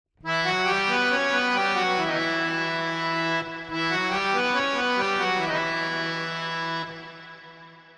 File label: Fx, Harmonioum, 3-4, Em, 70; Melody; Sample Banks